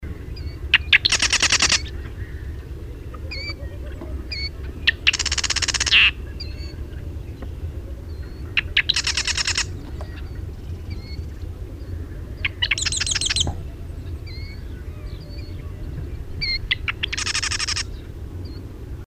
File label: Marsh Wren; Wrens